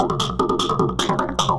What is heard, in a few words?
Throatbeat; Sonic Foundry (Sony Creative Software)